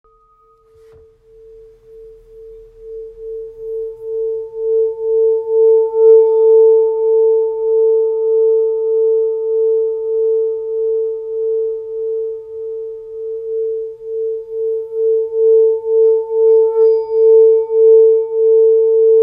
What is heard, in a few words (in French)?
Bol 13 cm